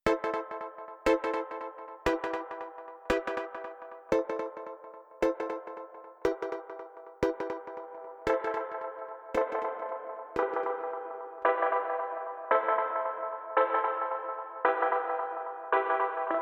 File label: Dubbi Spring